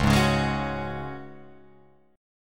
Db Suspended 4th Db down Guitar Open F, Dbsus4 chord; strummed